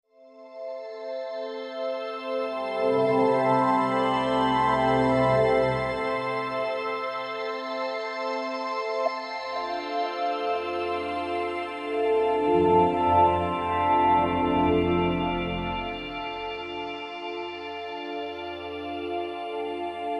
Major Chords; Beds

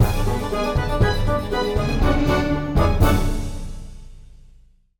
News transition

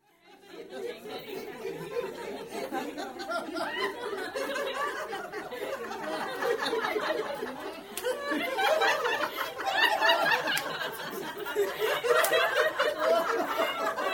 croud laughing; SOUND EFFECTS1

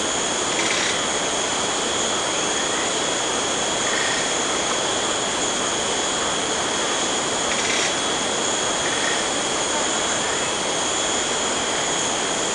jungle, nature; 1000fx

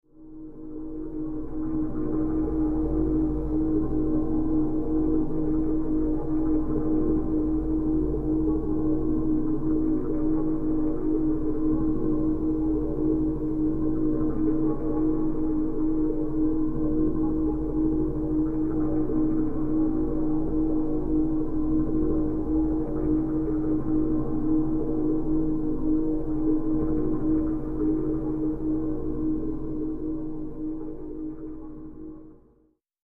Soundtrack

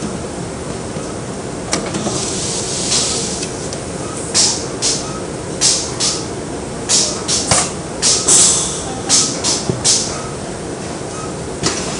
DRY CLEAN, INDUSTRY; 1000fx

